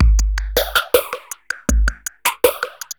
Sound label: DRUM LOOP ambient 160